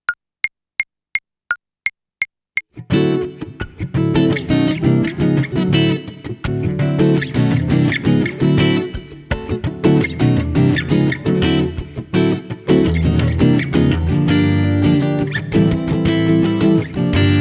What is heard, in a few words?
Instrumental; Mini Musical Tales